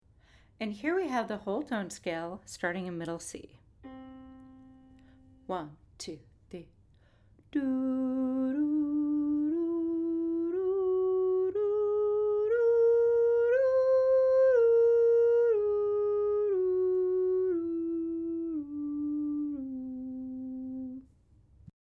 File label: Whole Tone Scale C